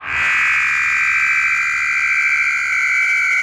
Feed driven, Feedback FX; 99Sounds; Dark Engine

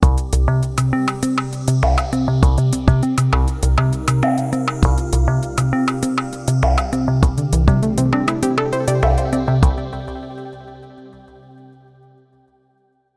Signature Sound; FXHome; Signature Tracks

